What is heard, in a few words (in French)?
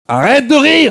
arete de rire